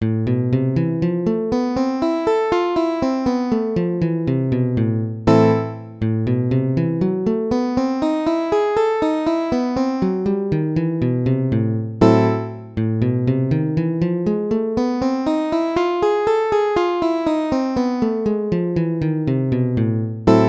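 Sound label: A minor gypsy scale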